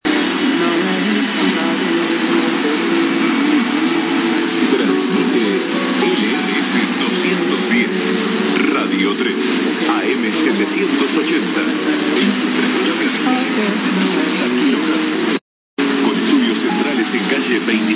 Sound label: Arg 1200 Radio Tres; bariloche